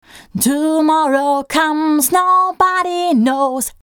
Vocal Compressor Heavy

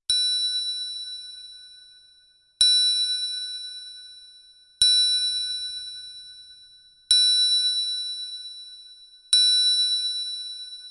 with Novation PEAK

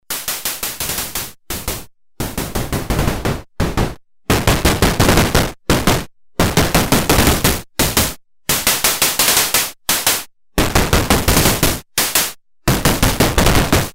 Analog Noise Comparator; Io Instruments Himalia

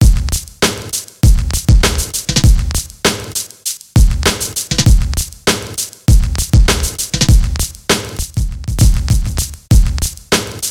Loop

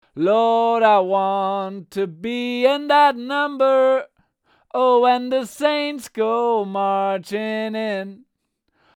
DPA 6066 Singing Headset

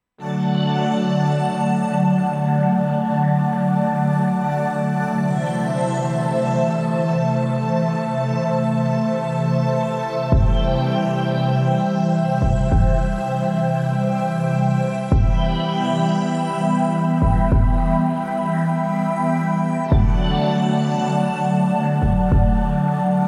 Kronos

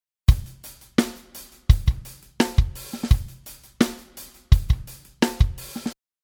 Loop Channel Strip

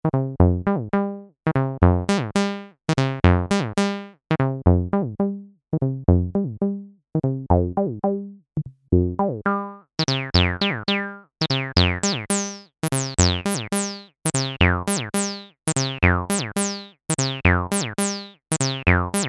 TD-3 Filter Tracking Resonance

